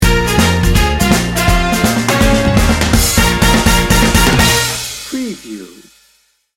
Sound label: jukebox blues sting bumper